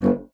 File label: bassoon G1; Xsample VOL-6; C BASSOON